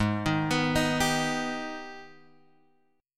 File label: G sharp Minor Major 7th G sharp down Guitar Drop D